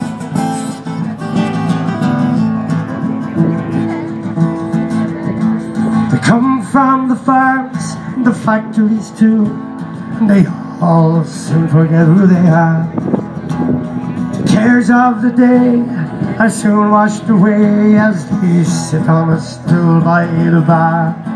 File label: irish folk music at the north sea beach of stenbjerg